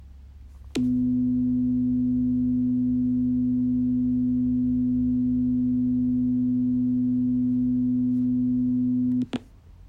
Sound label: Puredata 1 osc 2x